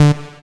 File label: SYN POLY, JD 800